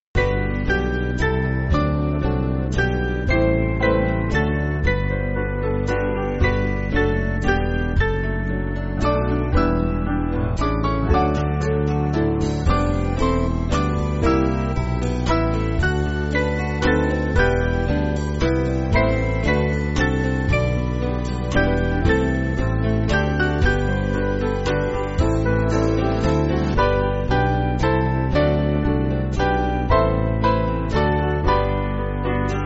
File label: Band